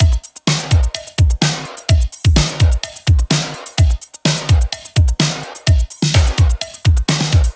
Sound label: Drums, Drum Loops 127bpm; Zero-G - Soundclash Nu Dance Electro; Thriller Killer